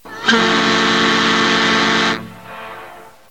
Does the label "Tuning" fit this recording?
No